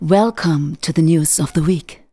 vox, Techno Trance; Ueberschall

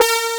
AN SYN, SYN & LEAD; SAMPLE WAVE MIXING 2

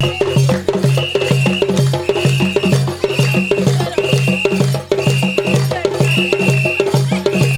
DANCE, ETHNIC JOURNEY, AFRICA; Best Service; Sound Cube

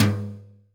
brush tom; Greytsounds; Scott Peers Sound Engineering

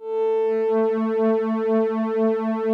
OBERHEIMS

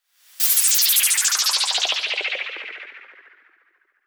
Breakdown FX, Underground UK House & Electro; Sonic Foundry (Sony Creative Software)

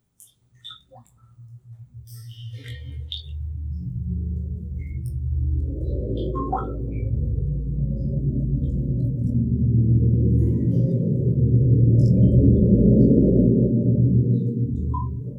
Cave; Sonic Foundry (Sony Creative Software); World Pop

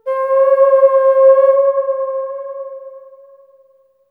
AF HRN, AMB HRN FX, Heart of Africa

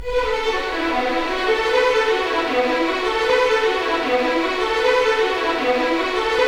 RIVER; East West; Scoring Tools; ORC; River Flow

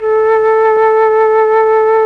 FLUT; ILIO; Propeller Island Legendary M400

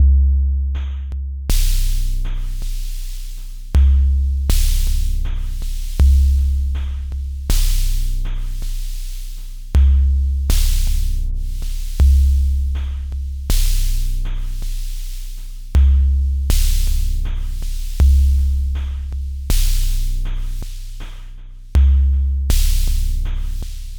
Splash, Percussion

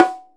Bongo Low f; Digital Sound Factory Virtuoso

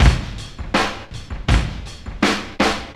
Straw 162, breaks; minihalfs

